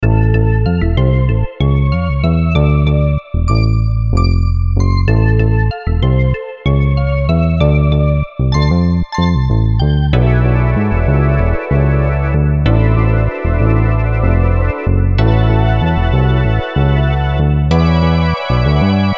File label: Blues Funny Riff